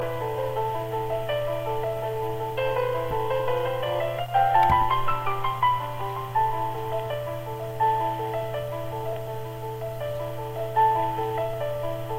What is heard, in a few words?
adwords hold music